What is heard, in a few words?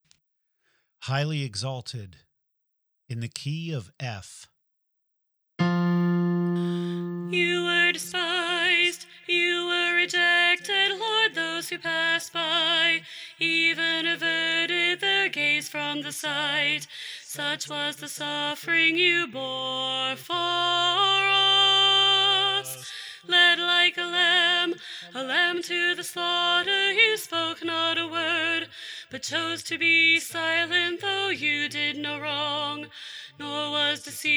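Highly Exalted Soprano, choir part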